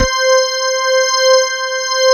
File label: ALL STOP, CHURCHY